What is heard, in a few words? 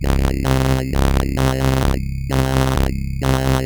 Sh101 Loop, 130BPM